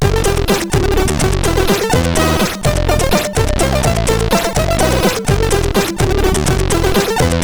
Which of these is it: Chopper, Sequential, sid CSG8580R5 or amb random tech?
sid CSG8580R5